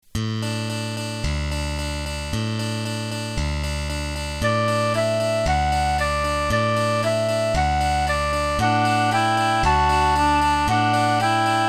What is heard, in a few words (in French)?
frere jacques instrumental